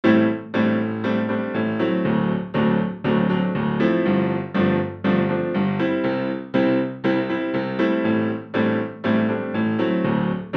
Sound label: pop 1970 London